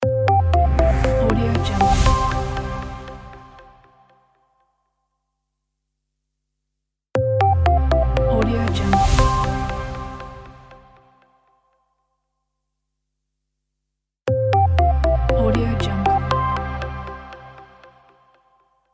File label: minimal logo